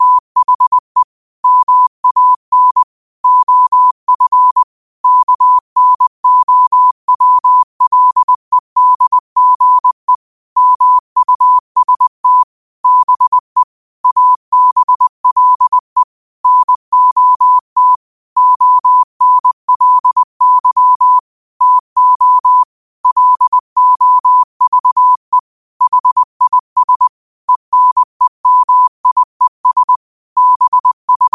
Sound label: 5 wpm, morse